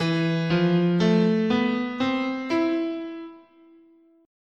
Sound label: E Japanese, scale